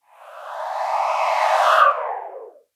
reverse sfx; drumkits; fl studio

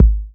Kick; FL Studio; young kico drumkit; Chronicles Of The Atlantic Trap (Sound Kit)

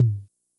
Tom; FL Studio; young kico drumkit

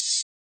open hat; FL Studio; xander brown drumkit

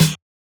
snare; goodtakimu drumkit; fl studio; drumkits

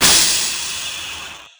crash, Cymbals; goodtakimu drumkit; fl studio; drumkits

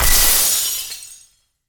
glass sfx; drumkits; fl studio

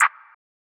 muffled clap; drumkits; fl studio; maily plugnb

